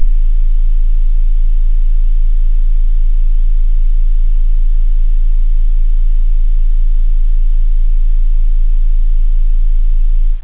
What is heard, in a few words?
sub base, bass, synth; da capo vol1